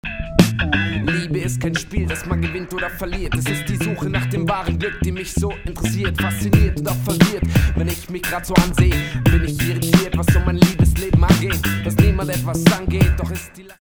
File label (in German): Mix unbearbeitet